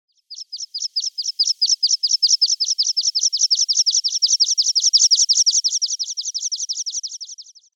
Bergpieper Anthus spinoletta; Vogelstimmen

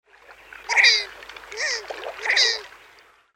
Mittelsaeger Merus serrator

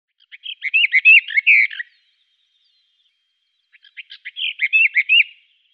Westliche Orpheusgrasmuecke Sylvia hortensis; Vogelstimmen

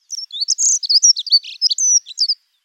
Heckenbraunelle Prunella modularis; Vogelstimmen

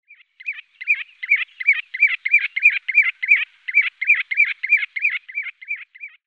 Sandregenpfeifer Charadrius hiaticula; Vogelstimmen

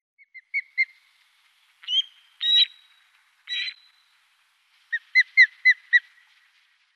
Fischadler Pandion haliaetus; Vogelstimmen

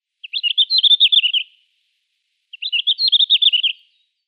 Blauschwanz Tarsiger cyanurus; Vogelstimmen

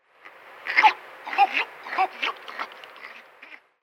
Waldrapp Geronticus eremita; Vogelstimmen

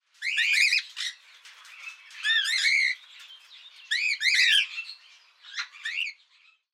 Nymphensittich Nymphicus hollandicus

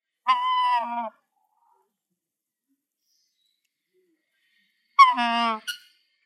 Kaisergans Anser canagicus; Vogelstimmen

